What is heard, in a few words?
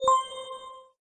bonus; stack jump